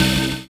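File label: Rave Synth, Dance Mega Jungle Rave; Best Service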